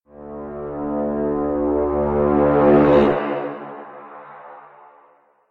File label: Cinematic War Horn